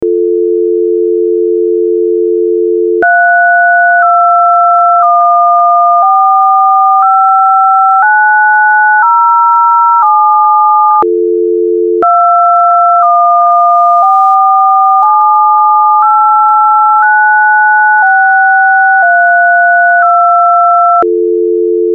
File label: dtmf